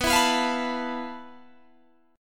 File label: F Major 7th Flat 5th B, guitar chord